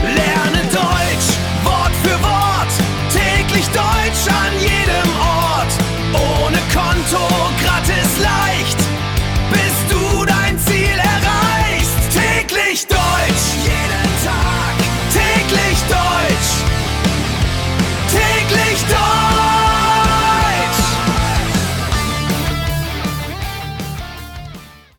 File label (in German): jingle